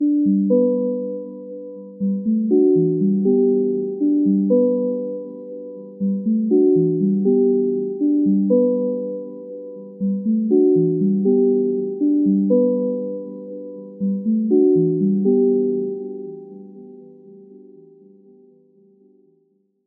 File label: healing key, hmd; Стандартные рингтоны для звонка и будильника, звуки уведомлений смартфона HMD